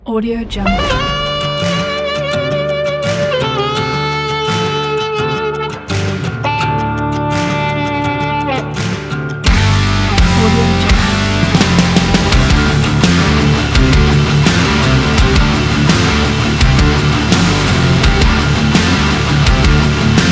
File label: dark country rock